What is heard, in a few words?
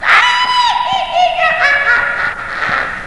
Witch Maniacal Laugh Halloween Sounds Car Horn